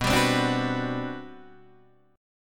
G Major 11th B

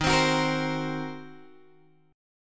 D sharp Minor 6th D sharp down Guitar Open D

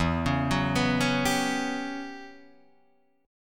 E Minor 6th Add 9th, Guitar, DADGAD

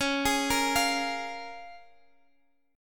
Db 6th Db, down, Guitar Half Step Down; arpeggiated

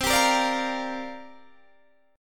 C 7th Suspended 2nd Suspended 4th, Guitar, Open D

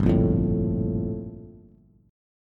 G Minor 9th, Bass; Gm9 strummed; JGuitar

